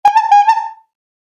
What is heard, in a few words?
clown